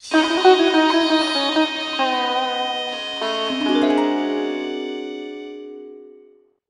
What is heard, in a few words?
Bari Sax; Simpsons Hit & Run; PS2